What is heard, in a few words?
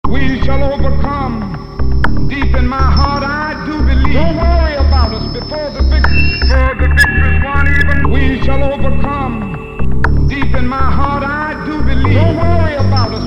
loop; martin luther